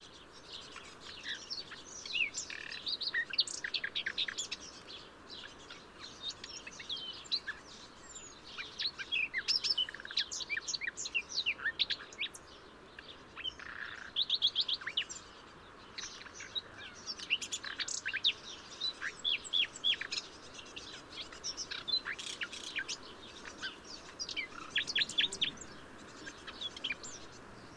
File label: Plastic Song Of A Spring Migrant